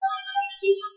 cast earth; Magic Stones

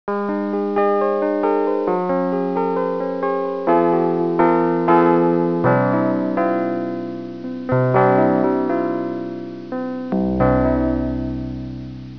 Musak; Repeater

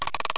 CREAK

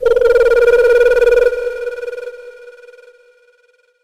teleport in; RobotRescue; music

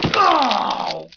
landhurt; PC Gamer IT CD 41; Daikatana

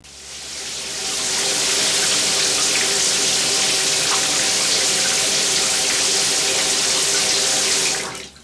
SHOWER; WAV Sound Effects; houshold; bathroom

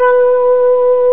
Flute

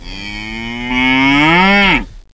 munch; Mr. Wonder's Greatest Toyshop on Earth

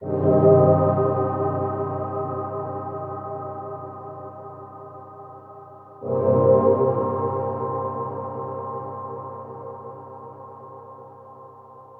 synth pad ambient 160 C; Sphere

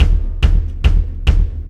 Bass Drum FX; ACID MUSIC; Drums

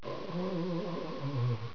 groan

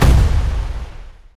impac rain

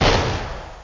shotgun; worms dc; tykes

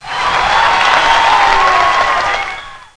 Sound correct

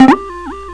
ball

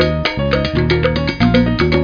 intro latin; Vitamin

